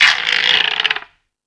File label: Tool Kit, Drum Machines E-MU Pro-Cussion